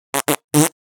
Fart Foley - Fart Combination - Thin Surprise; Hollywood Edge; sound designer's toolkit v2

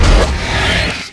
Menu Scifi; Menu FX

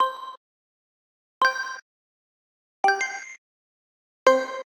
Digital Click Positive; boom library; The Interface

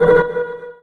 warning asteroid; Space Wave Race

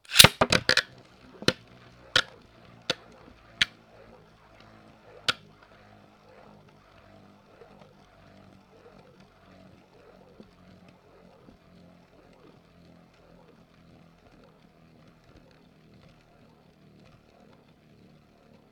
bayblade; devices accessories utilities; NS Studios - ultra sound collection

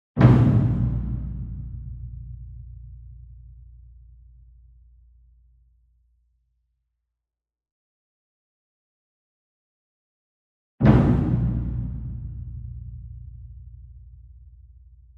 Gong Flam Soft Beater Far; SINGLE DRUM; boom library; Cinematic Strikes Construction Kit

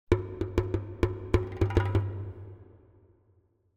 Drums Short African Dance - Slow; Hollywood Edge; sound designer's toolkit v2

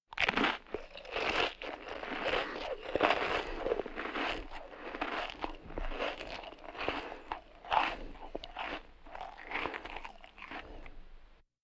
Vocal effects breathing Moaning Baby

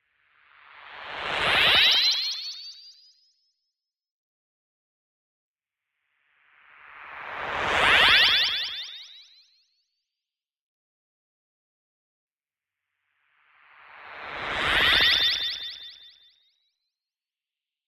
TONAL Reverse Power Up; boom library; Anime Essentials

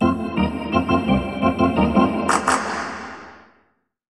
Hockey Organ

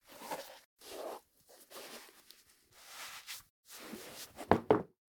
put on & take off snickers, human; NS Studios - ultra sound collection

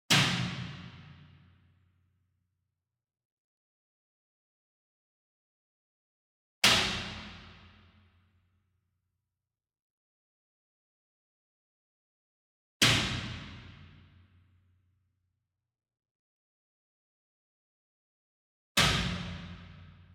Bass Drum Marching Hit Whip Beater Far; SINGLE DRUM; boom library; Cinematic Strikes Construction Kit

